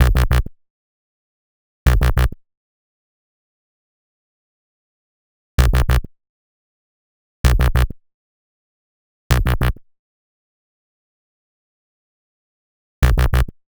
129 Bm Bass, Complex Electro Synths; Prime Loops; TranceOut

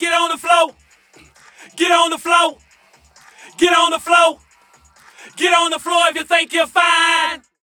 VOX; Loopmasters; Keith Mackenzie & Fixx Present Booty Breaks Vol.2

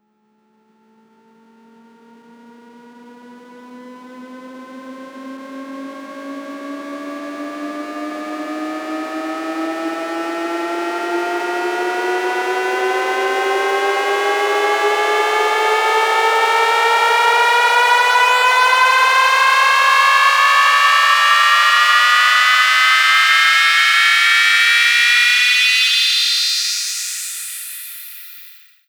FX Uplifter

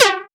Synth Shot G

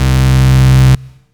Synth Shot C

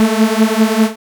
BLAST A2, dubstep; Jack Sparrow; Deep Dubstep Progressions

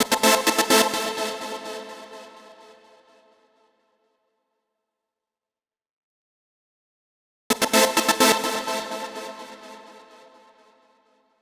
128 Am Gated Synths, Complex Electro Synths; Prime Loops; Speakerbox

